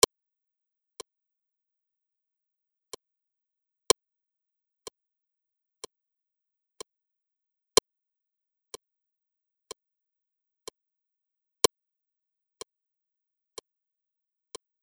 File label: Sunrise Flute; Zuni